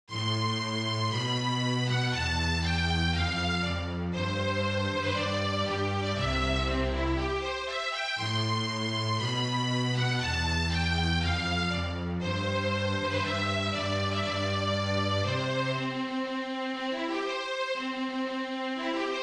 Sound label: Wed March duo, string quartet; Mendelssohn